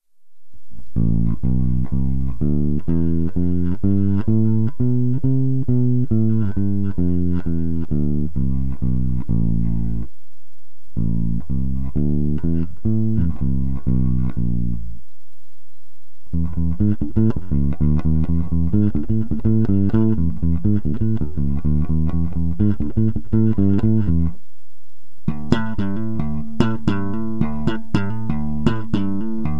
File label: fender tapewound